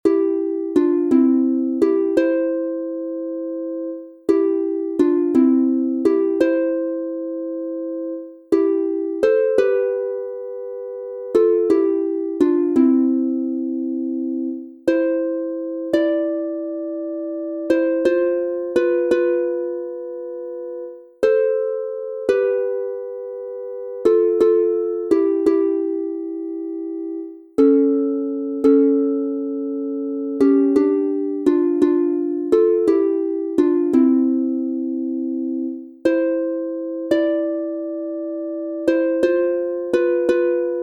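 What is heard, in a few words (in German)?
C Dur 2 stimmig instrumental, Harfe; Kuechen und Baenkellieder; Waldeslust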